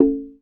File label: A1 tongue, Tongue Drum